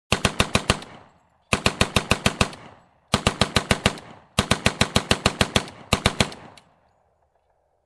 Slow machine gun shooting

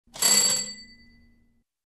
Ringing Bell

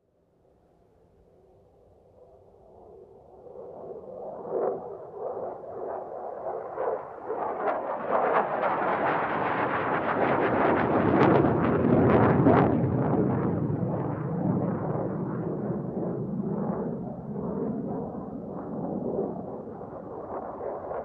Aircrafts passed by distant whirring sound

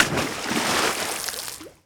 Hard Water Splash

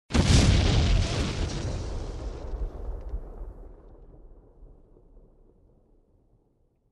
Fire Explosion with debris

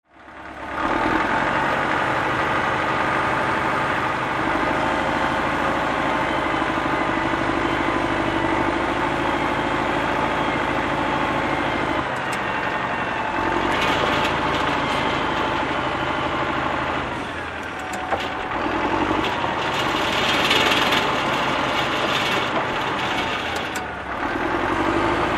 Truck in a construction site on work

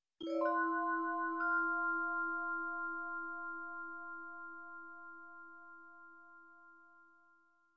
Mysterious vibraphone glissando

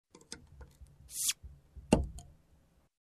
Vacuum Lid opening

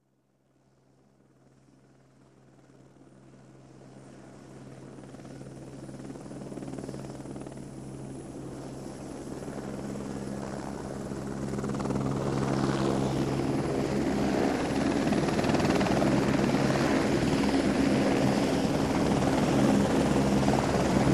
Helicopter landing engine shutoff

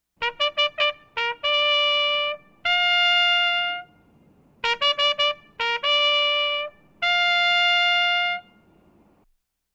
Trumpet Retreat

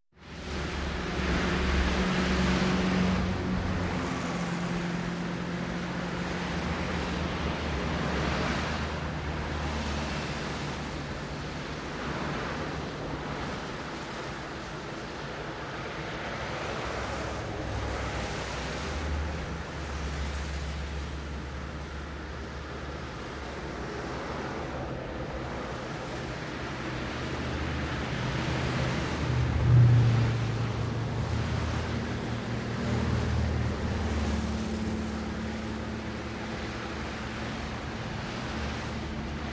Cars passing by on highway

